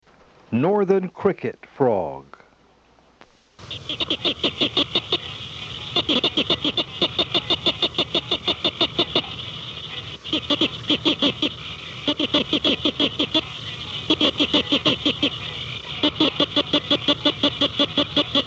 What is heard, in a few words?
Northern cricket frog (Acris crepitans); Family Hylidae - Treefrogs